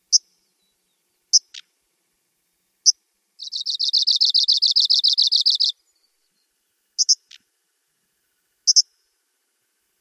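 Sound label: call and song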